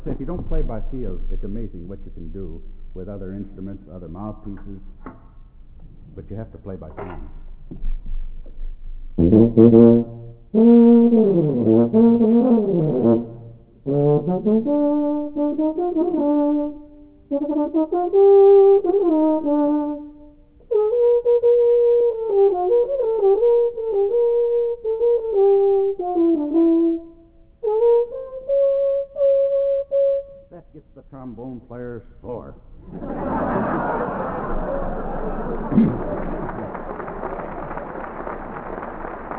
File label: piccolo tuba